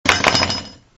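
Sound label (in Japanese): otosu, drop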